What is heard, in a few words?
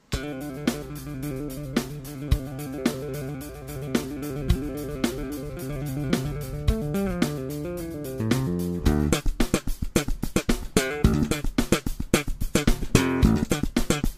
Extreme Slap Bass